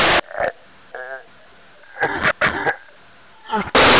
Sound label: zombie grunt idle